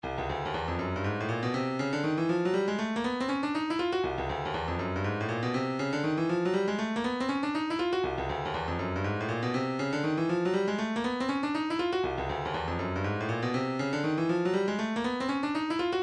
funk, midi

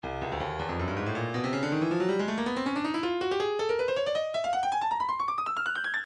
breakbeat 160 bpm; Stylus; drumnbeat

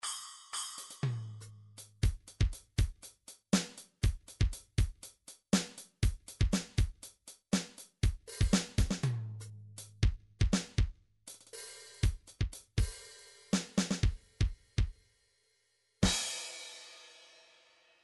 midi drums, funk

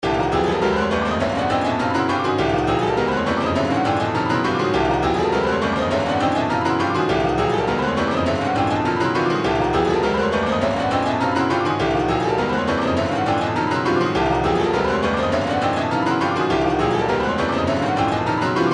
retro funk 102; livin large

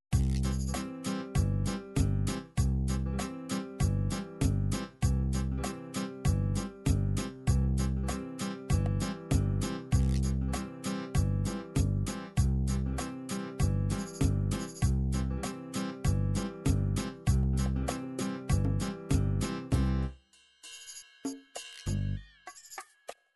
latin bolero, midi

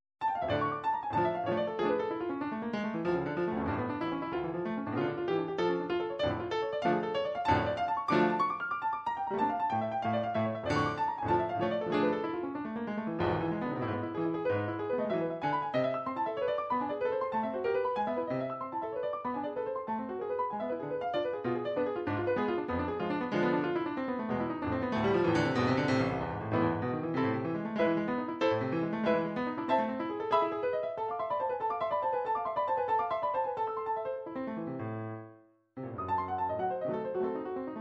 studies for piano, midi

